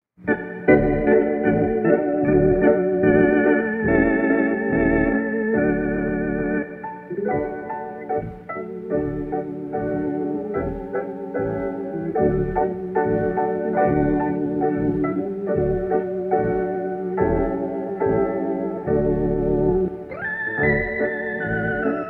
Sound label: briar rosebud (instrumental)